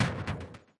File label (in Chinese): 攻击; webxoss